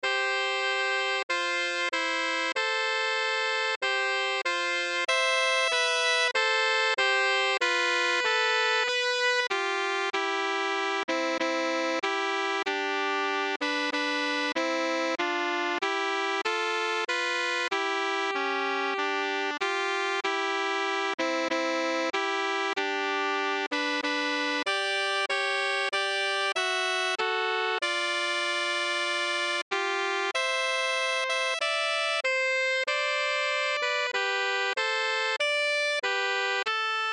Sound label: Sax Duet